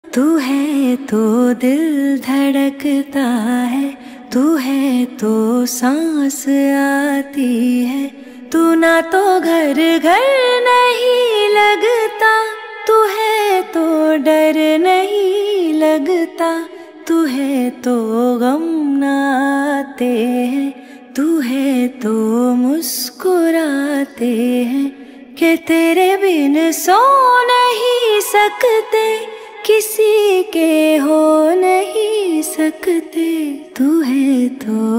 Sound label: Female Version Hindi Ringtone